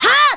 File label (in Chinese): 女人嘿哈声